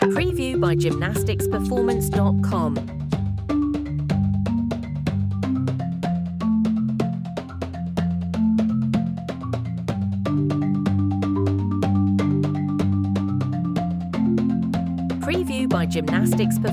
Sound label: african tribal high energy